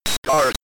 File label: 8 Bit Start